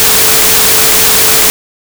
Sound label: binaural